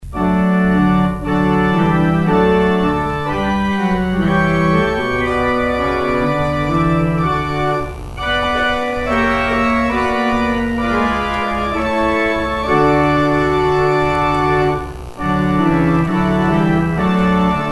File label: organ outro